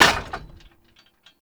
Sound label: Debris Metal Stone; Warframe; Entrati; Ambience